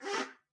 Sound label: clean